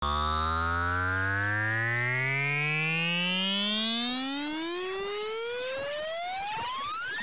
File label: Water Jet Clear; Zelda